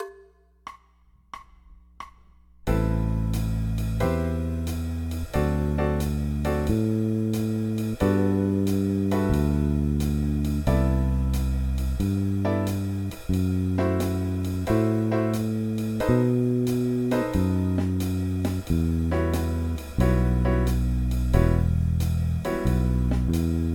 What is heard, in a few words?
bass line